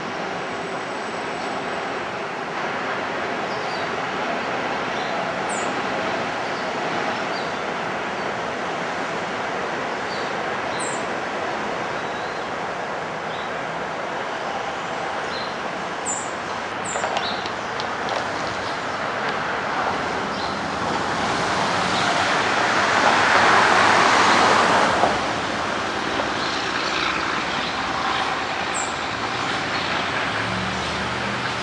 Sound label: Swainson's Thrush